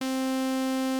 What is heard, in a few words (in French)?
Onde Dents De Scie